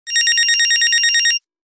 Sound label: Business Hi Loud, Business Rings